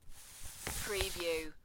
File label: paper slide